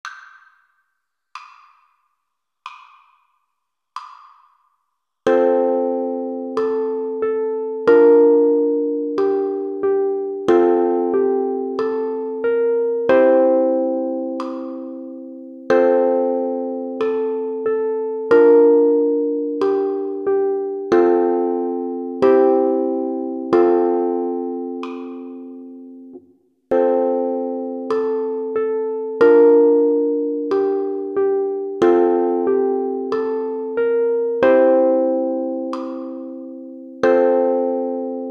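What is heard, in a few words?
45 bpm, ukulele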